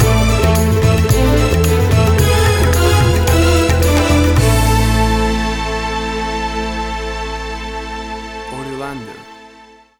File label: Asian Music Bed Stinger